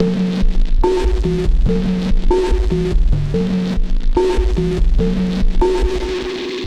Dirt Funk, Synth Sequences

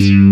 MOUTHER BASS, Synthsonic; Pro-Rec - Synthsonic; BASSES

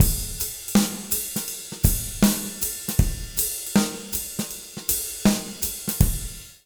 080 J ROCK, Jazz Latin Drumloops; USB Soundscan vol.08; AKAI

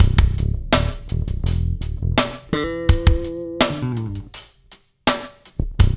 LOOP, Funky Ass Loops; East West

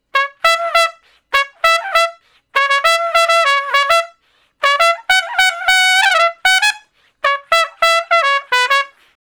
Trumpet Straight (Db), Horncraft for R&B Solos; Crimson Blue and Fabulous Horncraft 4 RnB

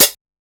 Hat Accent, classic machines dx

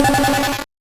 Noise A, 8-bit, Bitty Noises

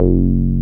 303, TB-303 BASS

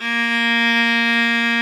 A#2 LA EQ, STRNGS; AKAI S-Series CD-ROM Sound Library VOL-8

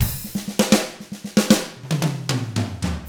Drums, R N B 6-8; ILIO; Double Platinum Drums 1

